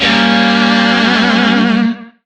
Shawzin Phrygian Chord H; Music; DE; Warframe; Lizzie Shawzin

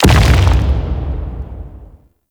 Rock Elemental Land; Warframe; Brawler

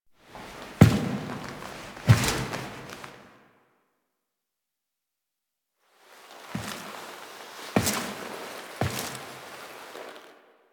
Foley; Warframe; Nineteen Ninety Nine; Quests; Hive Queen

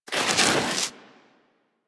Armadillo Roll Snow, Foley; Warframe; Hunting Critters

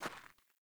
Grunt Boot Walk Gravel, Footsteps; Characters; Enemy; Melee Grunt; Boots; Sinecure

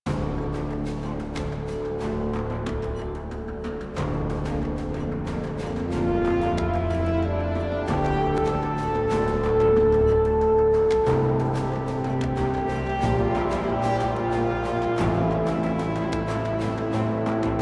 Ambient; TennoCon2022; Teshin

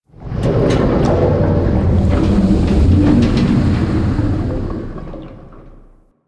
Metal Pipe One Off; Ambience; Props

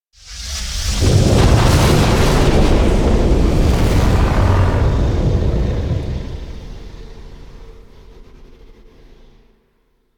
Solar Flare; Warframe; Quests; The New War

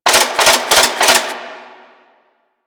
Shipyards Conveyor Loop; Ambience; Props; Warframe; Grineer Shipyards

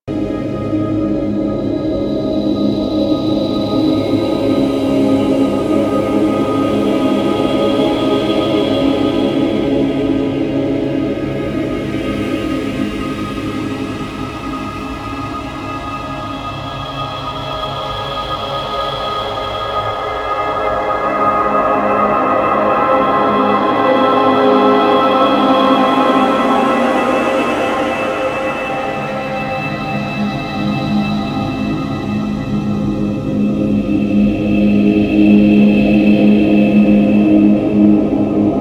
Hub Amb, Ambience, Interior; Warframe; Tenno Hub

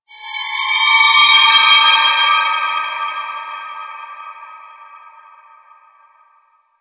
Space Exterior Dist Call, Space Battles; Ambience; Warframe

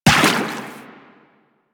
footstep water; Enemies; Warframe; Teshin; DE

